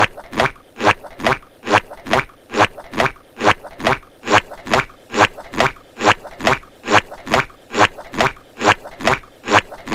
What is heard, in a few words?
walking sound effect; squidwrad